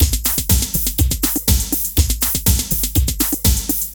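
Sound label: drum loops, right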